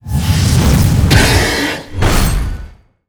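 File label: guardian spawn, monster; Bastet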